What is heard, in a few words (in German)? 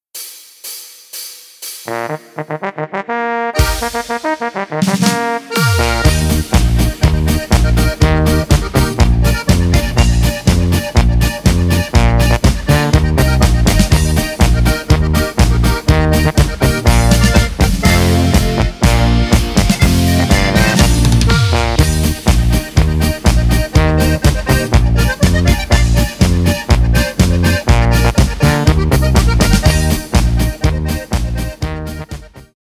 Marsch B ES AS DES ohne Chor